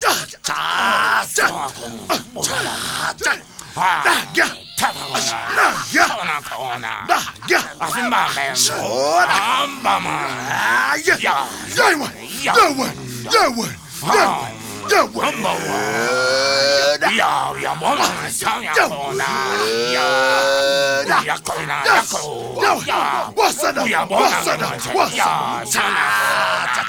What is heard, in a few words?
WILD MEN, World Voices, AFRO NOISE; ILIO; Vocal Planet VOL-5